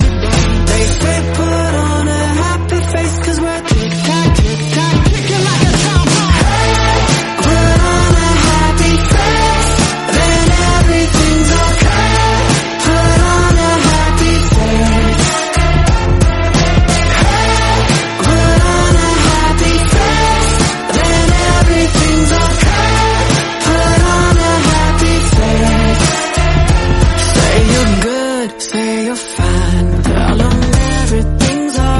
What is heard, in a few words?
happy birthday english song